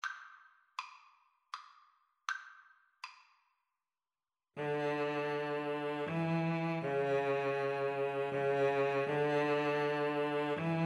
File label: jupiter, Violin Cello Duet; holst